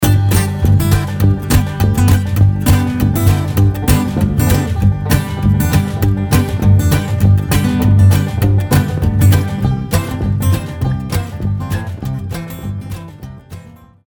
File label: acoustic blues